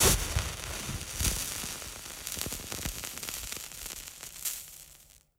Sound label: dynamite fuse; midnight guns